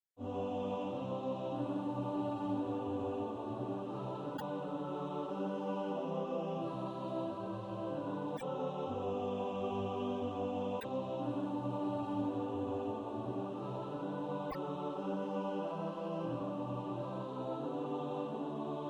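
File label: alto, Practise Music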